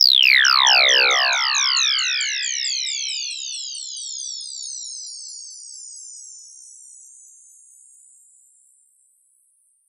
fx out of sight; One Shot FX; Sample Magic; Transmission-X

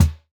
Kick, jungle breaks drum sounds

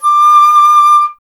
flute D; Miroslav Vitous - Solo Instruments VOL-2; Solo lnst. II

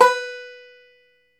FLAT TOP, Flat Top Banjo

